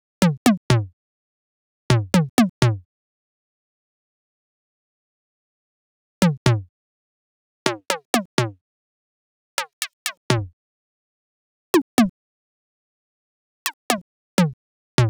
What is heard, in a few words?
Electro Percussion; Afterhours EDM; Electro Shock; Sonic Foundry (Sony Creative Software)